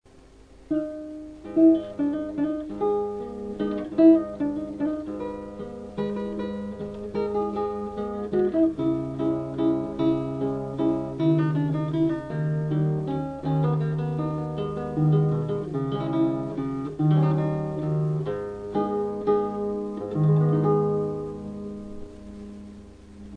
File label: easy sound, gut string